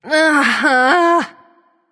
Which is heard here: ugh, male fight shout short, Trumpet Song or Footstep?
ugh